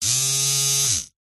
household electric trimmer no attachement motor whir buzz short; zapsplat